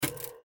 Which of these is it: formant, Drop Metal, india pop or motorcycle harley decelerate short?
Drop Metal